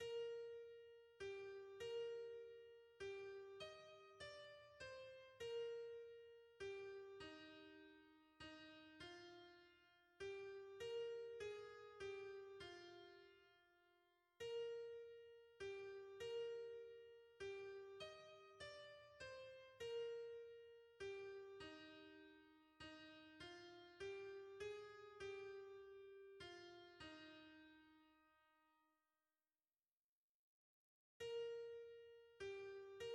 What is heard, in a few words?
hymns, soprano